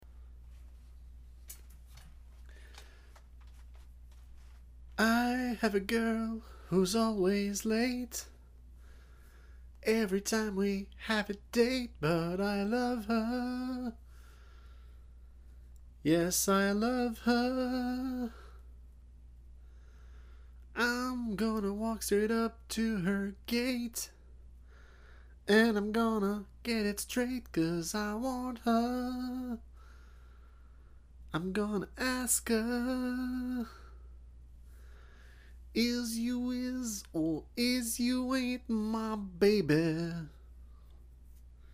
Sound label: bedroom preamp 3 o'clock door closed mic stand on rubber mat